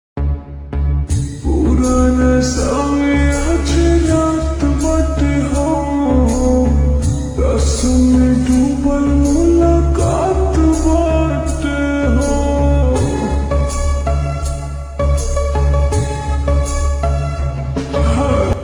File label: Ringtone slowed reverb